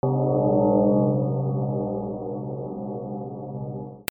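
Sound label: percussion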